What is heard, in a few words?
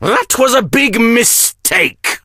hurt vo; snakeoil